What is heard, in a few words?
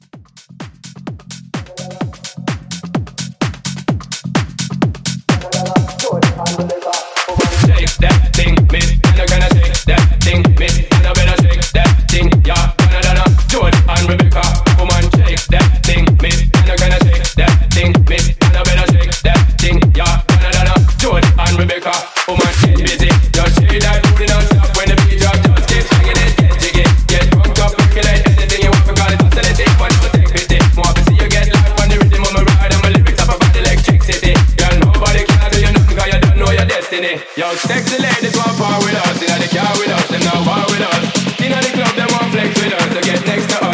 House Reboot